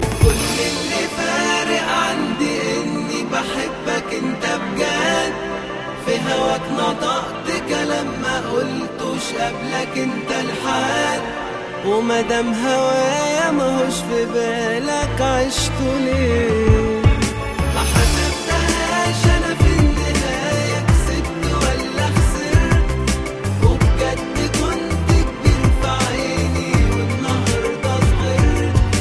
Arab Sad

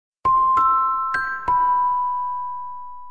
Missed Call Tone

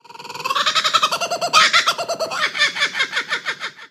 Kookaburra Call

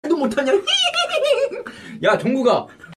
Wiper Laugh; Jin

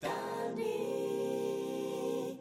Jingle; Space Dandy

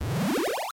Powerup

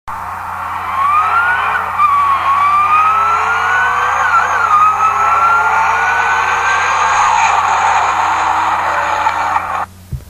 Cobra Flyby

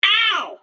Ooow

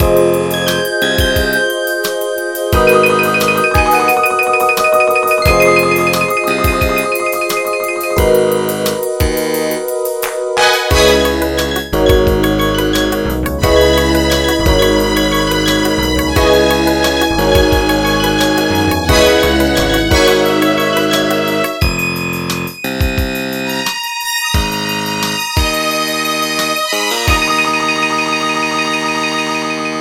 Nocturne Motorola

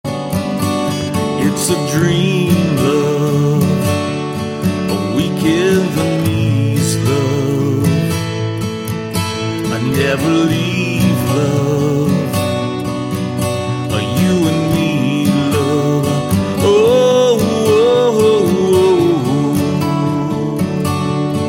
Dream Love Acoustic

